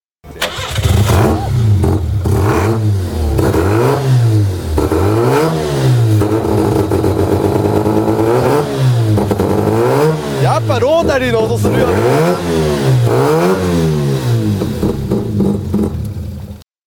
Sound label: bridgeport, Rotary Sounds